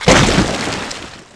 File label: hit mud; hits; Tony Hawk's Project 8; XBOX